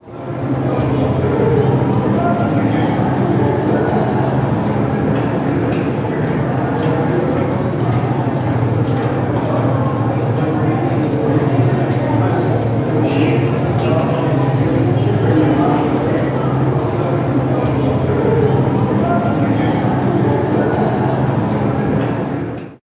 Walla; Cities

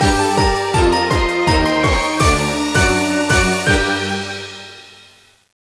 Orchestral Fin; Imagine Modern Dancer; Nintendo DS

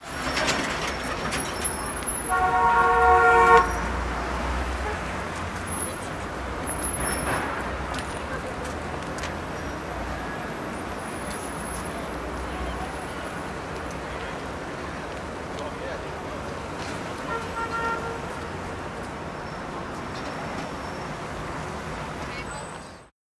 Niagara falls City

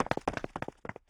Run Come Two

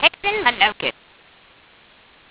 Spell; Abracadaball; Android

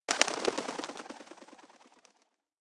Ambience Menu Pigeon Wings

